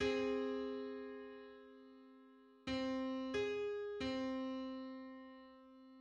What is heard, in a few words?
Four-hundred-third harmonic on C